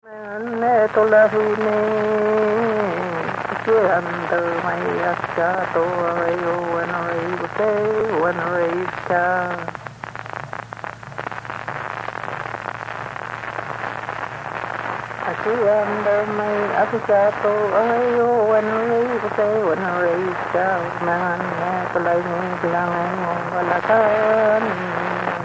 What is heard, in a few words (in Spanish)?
Ranchera, Zapala Neuquén Argentina